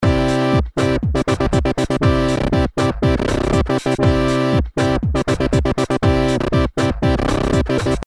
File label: lfo rate auto